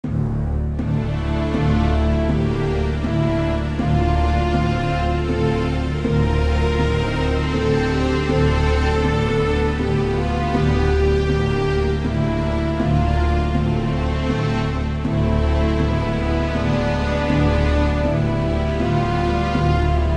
failed

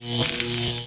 Game X-Ray Start

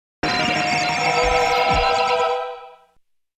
Stormy Saxophone; king of fighters neogeo s soundtrack 10th anniversary memorial box vol. 1

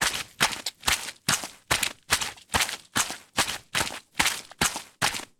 Boss Pres Human Footsteps Loop

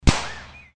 One Shot Of Gun Ak 47; Dude Theft War; Android

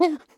effort; Scrat

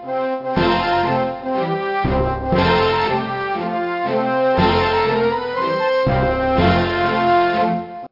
music flag

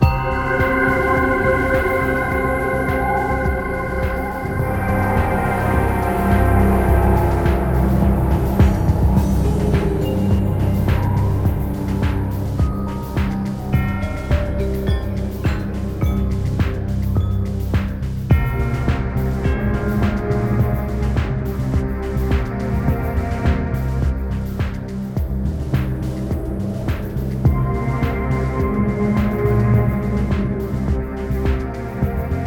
creepy battle; Spiral Knights

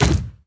menu negative sound; Dash Quest; android

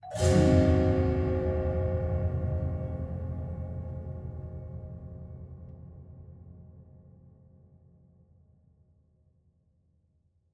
Stingers Wheel of Fate - fail

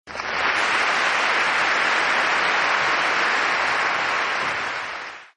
award Cheer; Dash Quest; android

